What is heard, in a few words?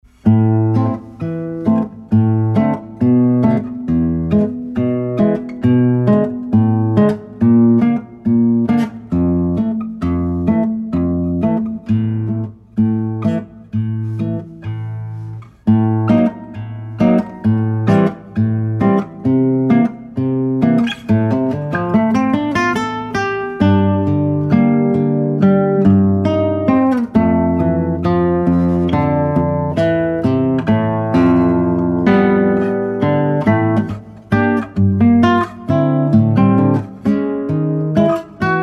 instrumental